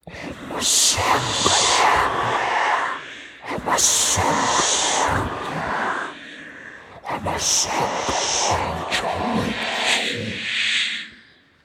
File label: Voodoo Attack Idle; We Are the Dwarves